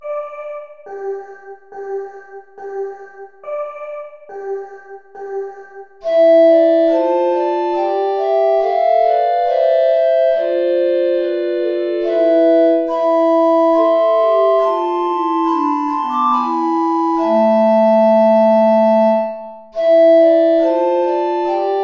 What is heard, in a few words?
play along without tenor recorder